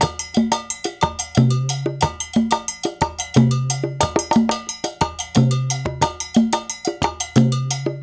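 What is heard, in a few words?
120 bpm, 6/8; Spectrasonics; Supreme Beats; African Contemporary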